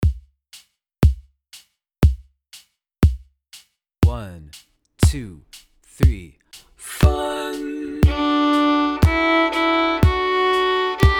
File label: split hoedown